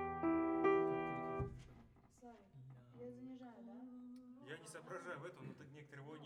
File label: piano